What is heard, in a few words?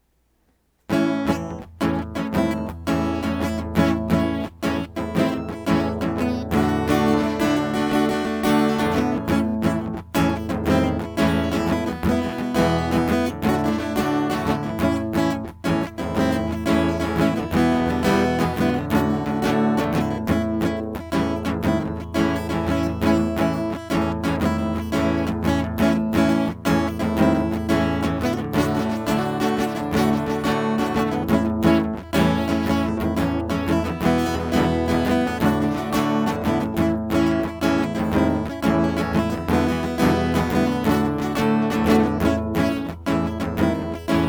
Guitar Instrumental